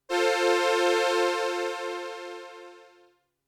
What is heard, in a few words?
Strings; opxpro2; Riddle